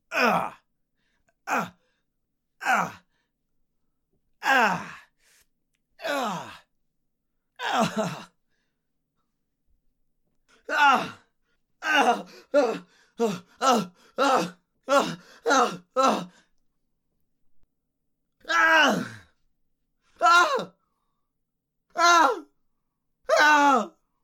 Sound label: Deaths